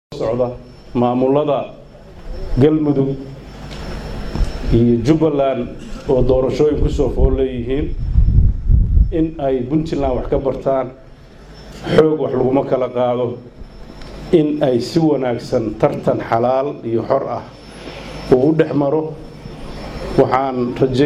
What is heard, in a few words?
Sax